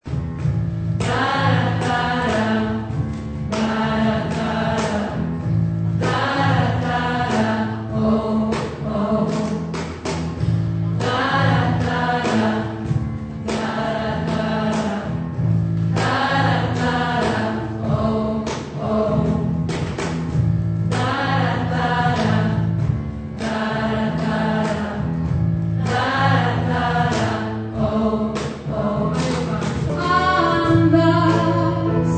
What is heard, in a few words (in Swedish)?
säga någonting bra alt, stammor